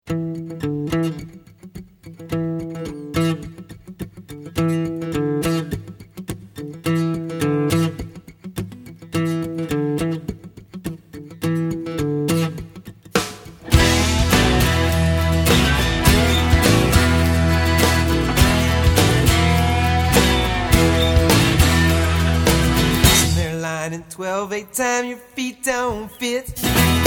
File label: Blues